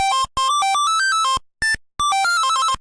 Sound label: Circus Jingle, Music; Donkey Kong